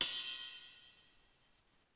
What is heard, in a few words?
Ride Bell, Percussion; Giga Samples Collection; ELQ-DrumQit; rock toms